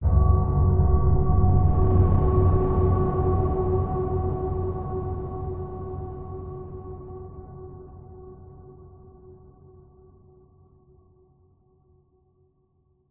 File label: horror hit dark ominous scary choir ahhs